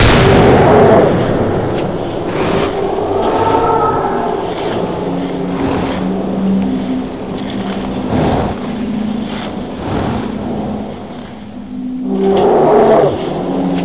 fear music

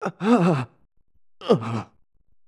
death; radditz

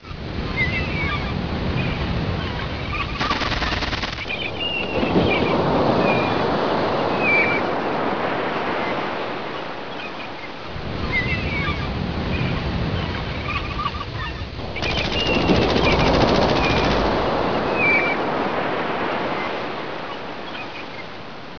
ocean

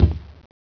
metal

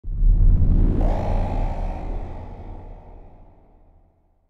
Alien Com

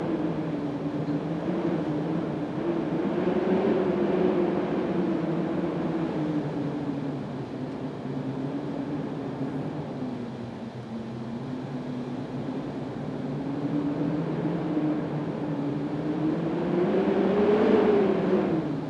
asylum wind interior

